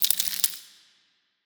small leg up; biogenesis

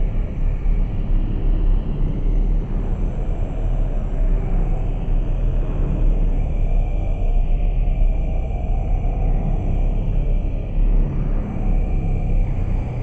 ship colossus idle; Stellaris; shroud; ships

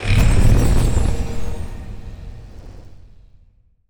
necroid moving out construction; Stellaris; necroids

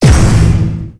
tachyon lance hit; weapons; Stellaris

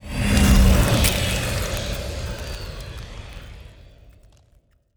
pro ship mauler move; biogenesis

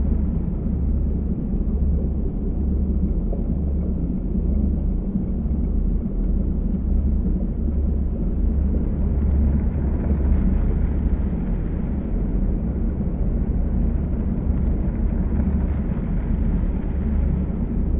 ship research station idle; aquatics

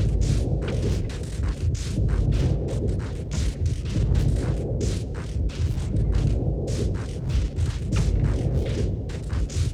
space cloud idle; Stellaris; other entities

